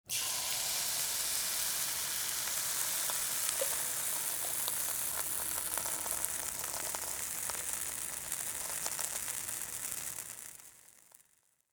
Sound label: Frying Pancakes